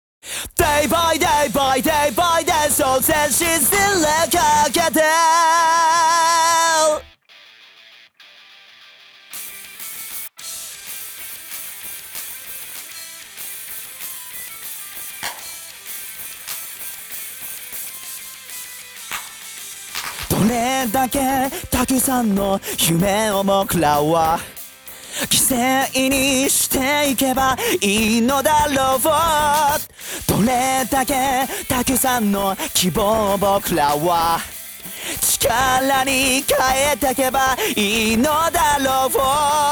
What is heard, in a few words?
Acapella; Gintama